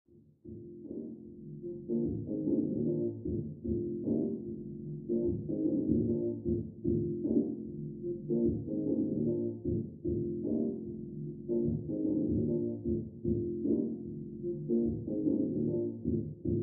Mx Hip Hop Beat

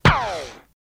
Machine Gun Silenced, silenced bursts

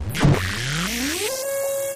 synth efx rise

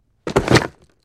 Football Helmet Pic, football

